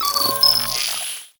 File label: Contact Telemetry; Cavia; Warframe; Tau; Cinematics; LastBreath